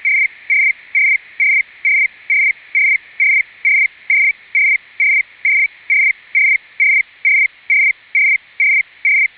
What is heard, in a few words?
SITOR-A BETA, SITOR-A in ISS mode idling on BETAS; FSK-systems